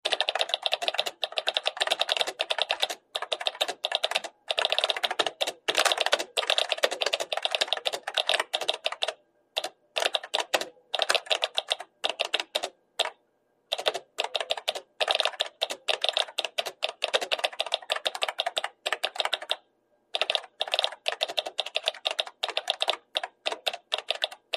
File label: Vintage Keyboard Tap, typing